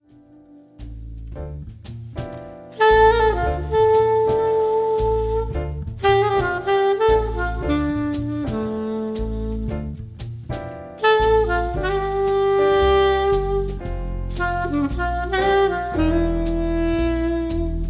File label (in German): Saxophonistin